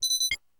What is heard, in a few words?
Notification